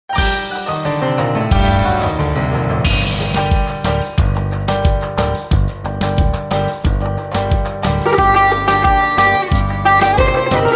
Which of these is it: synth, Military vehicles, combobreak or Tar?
Tar